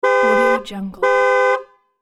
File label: car alarm loop